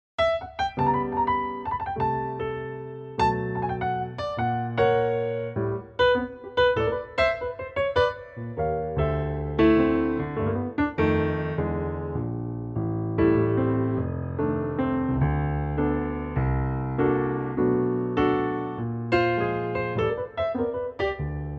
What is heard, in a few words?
G piano